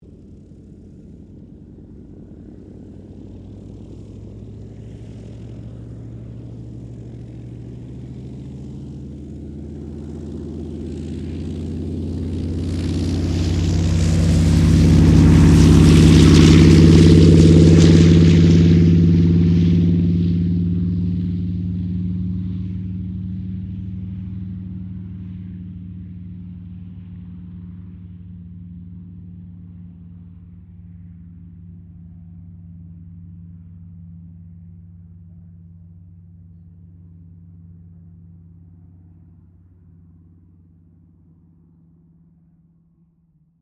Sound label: AIRCRAFT CA-13 BOOMERANG TAKEOFF; Airplanes (Sound Effects:Vehicles)